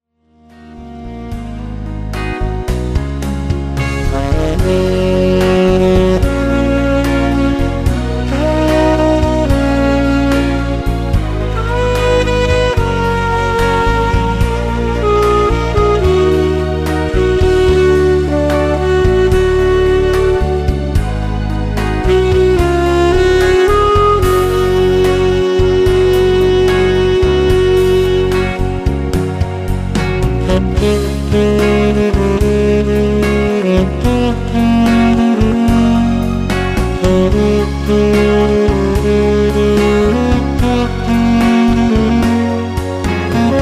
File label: RELAX WITH SAX